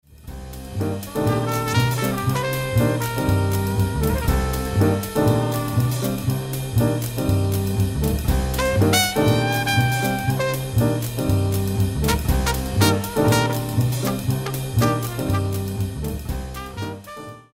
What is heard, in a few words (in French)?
JAZZ